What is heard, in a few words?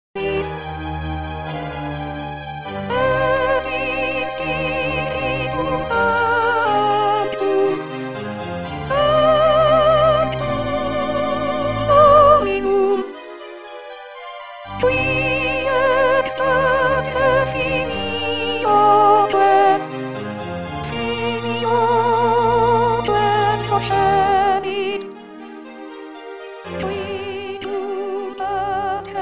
Soprano; Puccini; Messa; Credo; spiritum